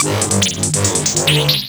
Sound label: Arcade Riffs